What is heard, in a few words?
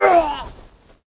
troll damage, monster